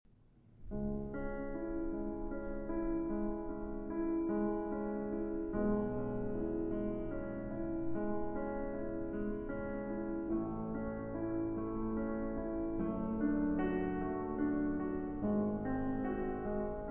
Piano Sonata in C- Sharp Minor, Adagio sostenuto

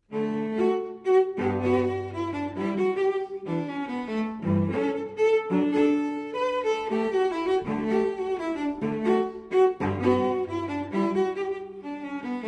Cello Suite in D Major Gavottes I & II; Cello Suite No. 6 in D Major, BWV 1012

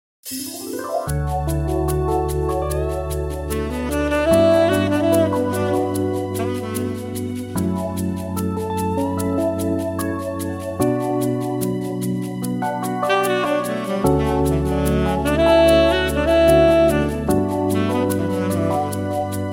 Jazz

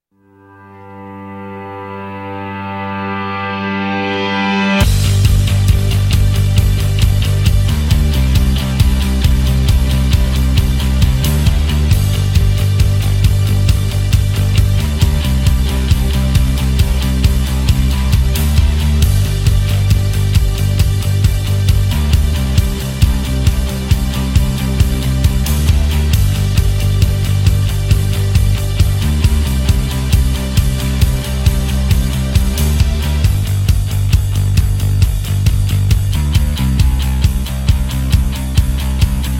Instrumental

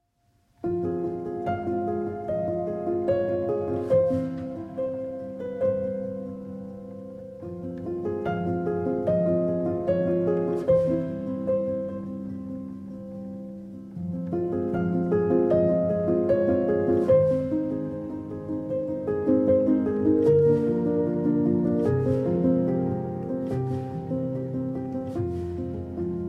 Nocturne in F Minor, for Piano, Violin, Cello; Op. 55, No. 1

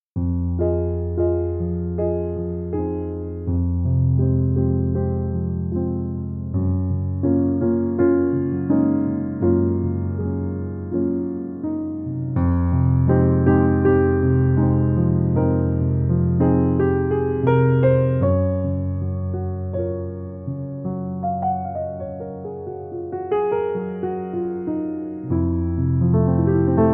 Jazz

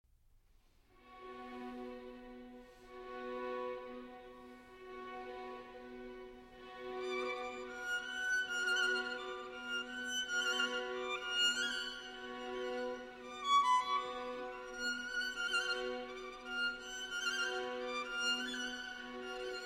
Romanian Folk Dances; BB 68, Sz. 56; Bartók; No. 3, Pê-l

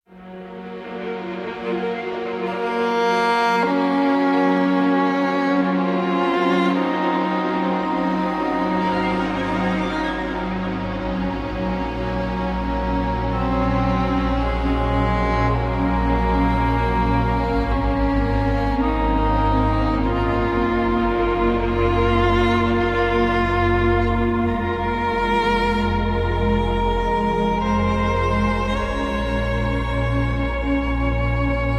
Violin

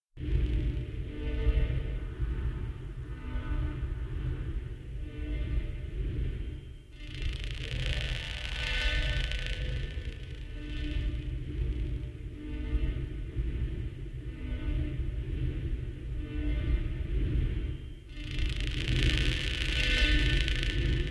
Out-of-Tune Piano, Basement; St Mary's Hospital Basement; Electriksnippets

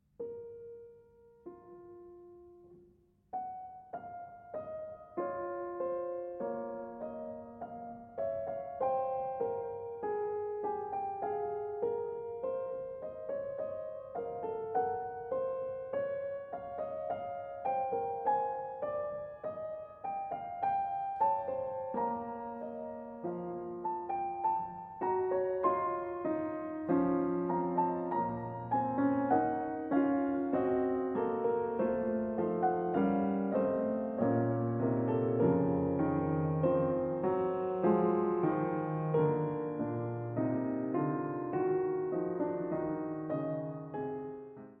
Fugue in B-Flat Minor; Prelude & Fugue in B-Flat Minor, BWV 867

